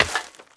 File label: trash; casualtiesunknown